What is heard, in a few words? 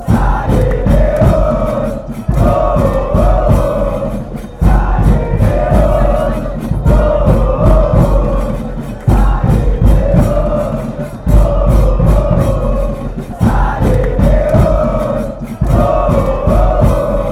chant, fanchants, colombia; santa fe